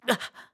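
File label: Hurt, voice; Link; BOTW; Breath of the Wild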